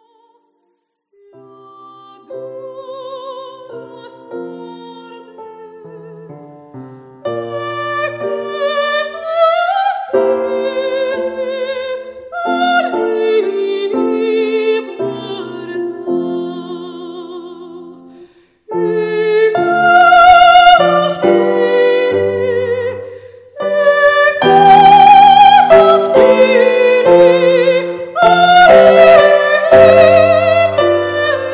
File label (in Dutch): Recitatief en Aria; Lascia chio pianga uit Rinaldo – G. Handel